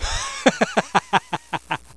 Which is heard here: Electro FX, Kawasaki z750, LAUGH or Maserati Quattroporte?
LAUGH